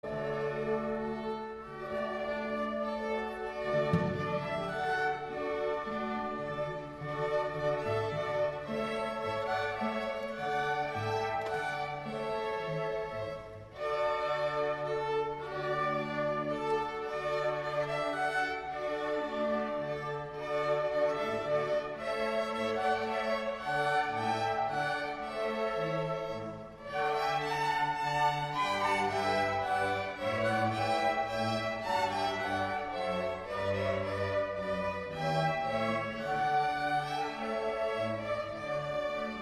Junges Ensemble, Menuett; Konzertmitschnitt vom 20. Dezember 2016

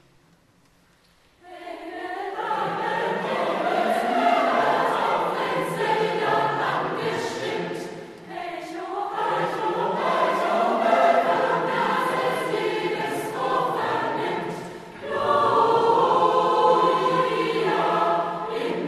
Chor und Jubilaeumschor; Engel haben Himmelslieder; Traditional

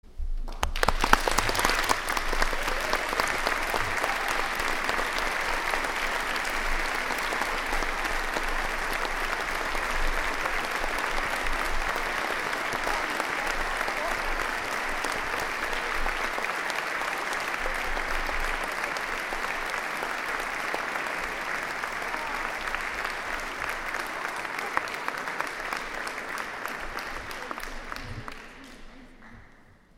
Kammermusik Triosonate Applaus

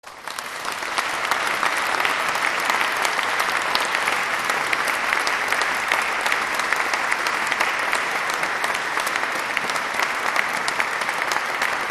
Applaus

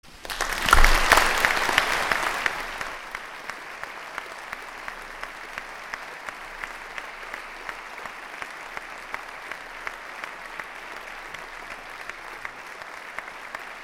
Chor und Jubilaeumschor Applaus

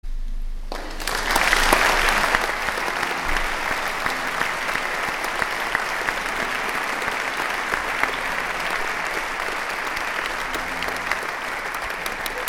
Chor und Jubilaeumschor; Ben Chorim Sommer Wetterich Freunde Dass Der Mandelzweig Applaus

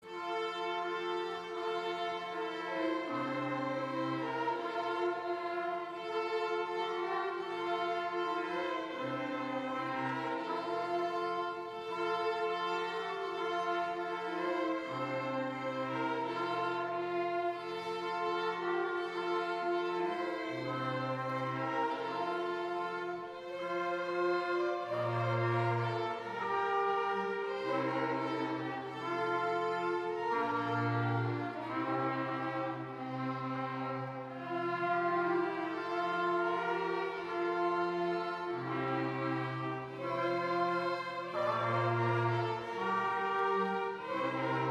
Junges Ensemble, Traditional aus Frankreich

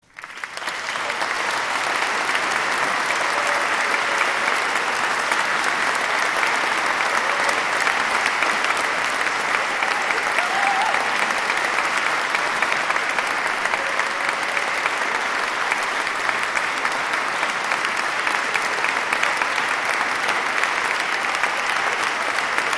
Applaus

